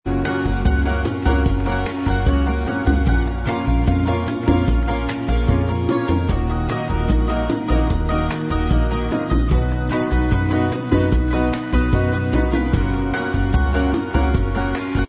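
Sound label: bossa style instrumental, backing tracks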